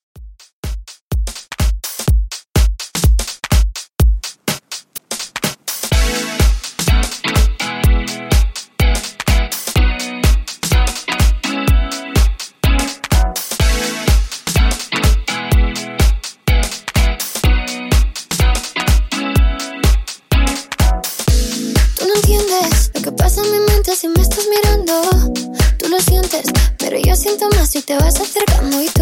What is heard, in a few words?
Club Extended 125Bpm